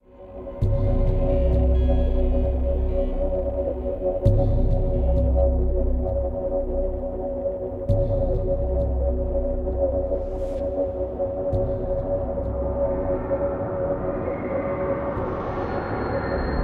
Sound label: ambient bed; AirMech Command VR